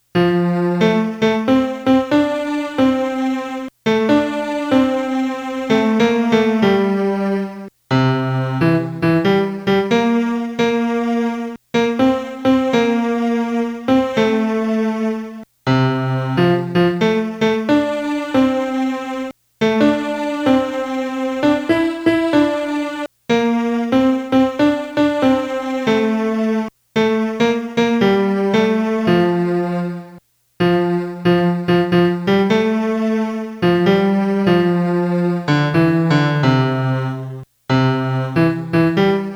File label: melody